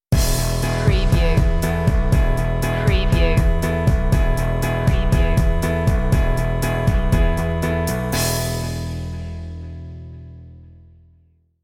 in the wind stinger

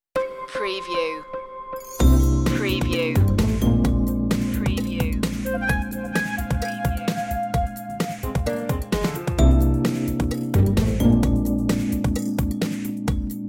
life style tv light comedy

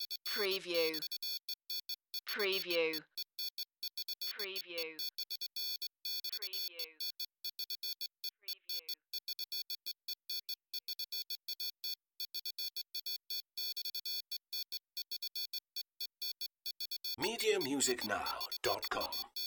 Teletype Readout Beeps

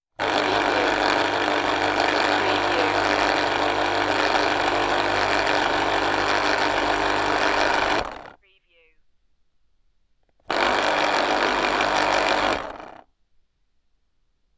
fruit juice extractor

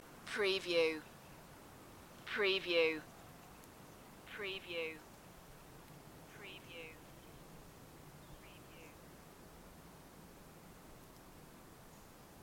Redwood Grove Light Wind Bird Chirps

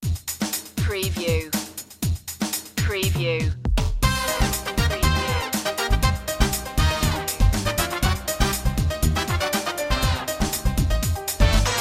latin express

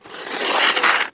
Snow and Ice, metal slides on ice; Weather sound effects

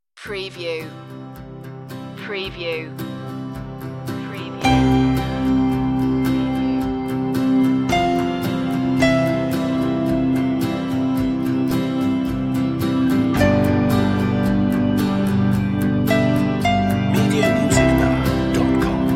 piano lead

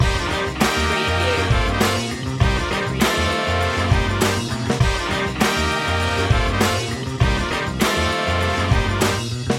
funkin yell loop

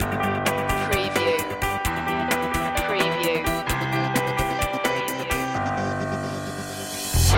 loop breakdown

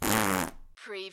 CARTOON FART WET

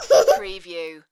Toddler Laugh

